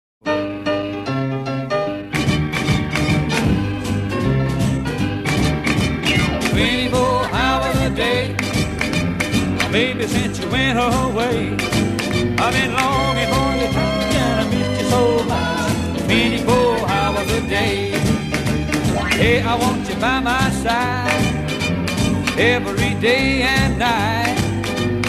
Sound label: with strings, mono; Rock’n’Roll